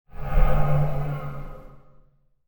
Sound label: Tavern Door Creaking; Creepy Door Sound Effects; fantasy sound effect; role playing games, magic videogames